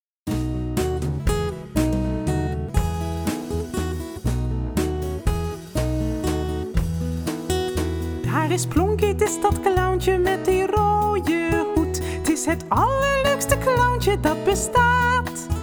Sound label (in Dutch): tralalalala